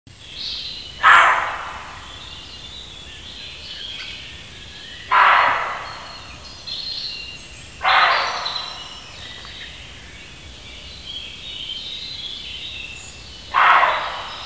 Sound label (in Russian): Косуля лай самца